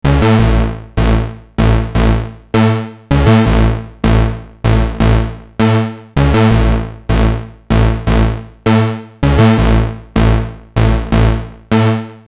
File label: pwm SH7